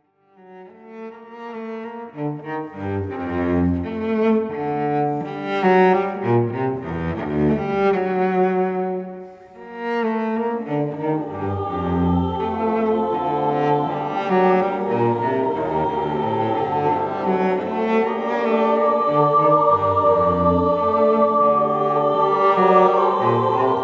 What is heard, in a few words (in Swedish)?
Två menuetter